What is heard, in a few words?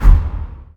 Menu Escape; Custom UI Sounds; Dark Souls 3